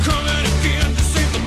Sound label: fire, weapons